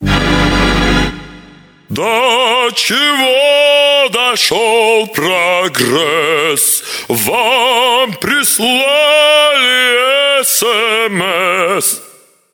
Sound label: sms